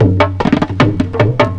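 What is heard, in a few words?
DHOLAK RHYTHM; Greytsounds; INDIAN PERC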